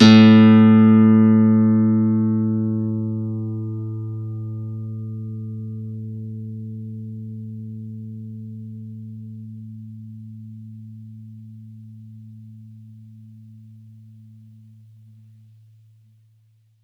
Campfire Nylon Guitar; Worra's Campfire Nylon

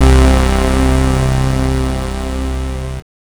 Bass Note C

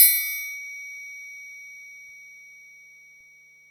Triangle Open, Korg 05R-W Korg Percussion; 300 Drum Machines

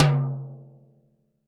TD-20 Tom A, Roland TD-20 Toms

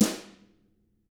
TAMA; ILIO; Double Platinum Drums 1

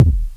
Low Bass Drum, MultiMoog

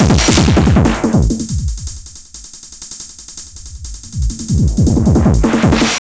TH 909 160, Hard & Loud Techno

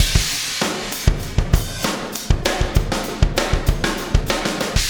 Wormhole Beat, Processed Drumkits

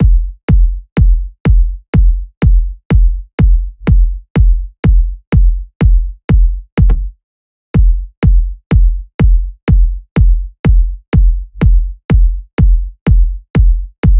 loop kick 124; Seismic Loops

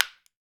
WOOD SPOONS; Jew's Harp & Spoons

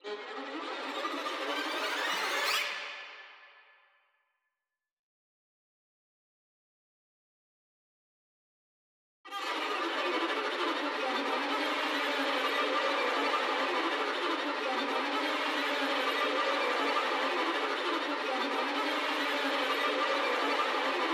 violin fx

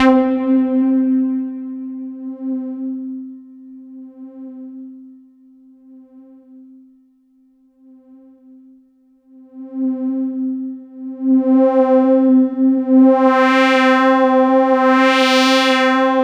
Roland JV 2080, interval; MG INTERVAL

